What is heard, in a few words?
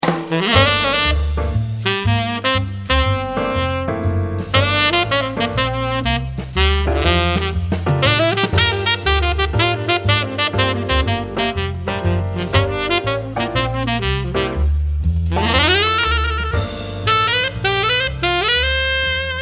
Tenor Jazz; gottsu